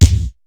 ECHO KIT2; Rubber Chicken Software Sample Bank; DRUMS + PERC

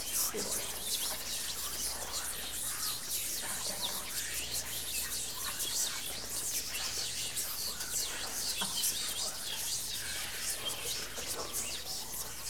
WHISPER; WHISPERS

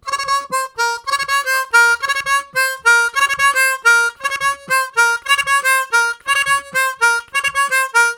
Triplets, Harmonica Riffs; Zero G

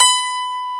HARPSICH

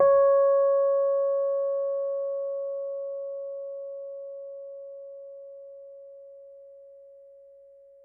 C#5, Rhodes Mark II; mkII 88 key MID; CEPCo Sample Series Vol. 3

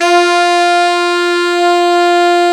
A SAX

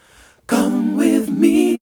VOCALS; Ueberschall; Jam Box

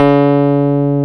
KEY MKS20 E, MKS-20 E.P; Roland L-CD701; E.Pianos

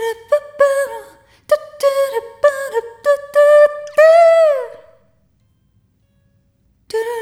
Rhythmic A

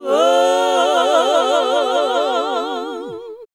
HAL GOSP. MIXED; Sound & Vision - Gigapack II CD 1 (Roland); HAL Gosp.Mixed 2

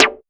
RESO SN, SNARE; AKAI S-Series CD-ROM Sound Library VOL-4

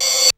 RZ1 ride; Crypton - DrumBase5000

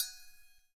CYM, Ethno Techno; ILIO - Ethno Techno

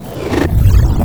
FX REVERSE

